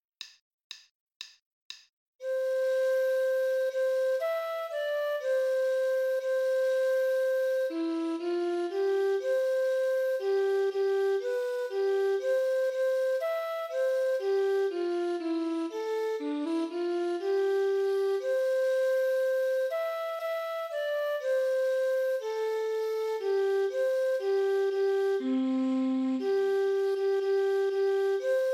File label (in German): Floete, Spielmannszug; Froehliche Weihnacht